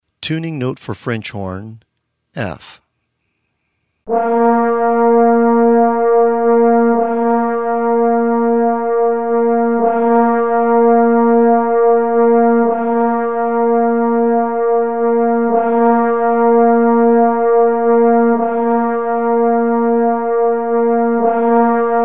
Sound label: band french horn tuning